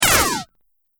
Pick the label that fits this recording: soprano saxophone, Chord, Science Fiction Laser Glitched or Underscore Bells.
Science Fiction Laser Glitched